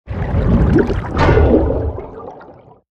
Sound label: Anchored Totem Play